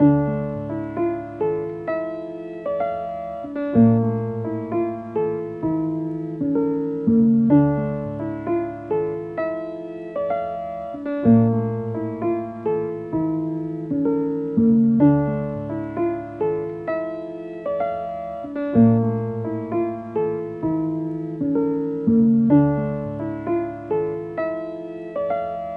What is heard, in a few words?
Piano, 128 BPM C# Min; Melodies; Loop Stems; Cymatics; 2022 Melody Mystery Expansion; Chicago